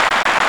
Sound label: Flakes; MOBICLIC; langues